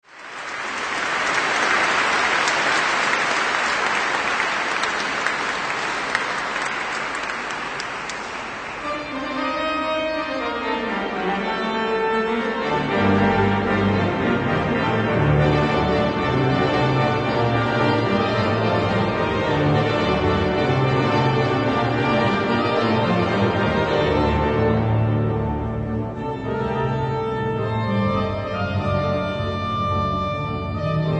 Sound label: pasodoble, organ; bruselas